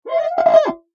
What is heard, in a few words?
Foley Glass Squeak Clean Window Single; Squeaks; Objects